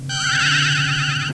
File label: flash, cartoon sfx